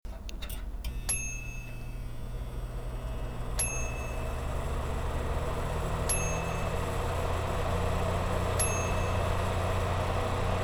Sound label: Space Heater